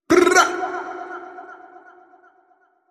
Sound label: Tongue Roll